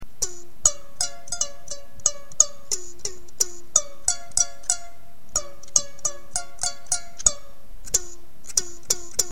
kannel

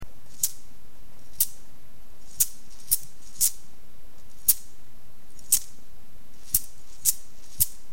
vardapill

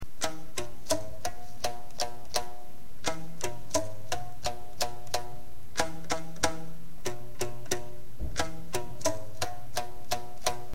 kitarr

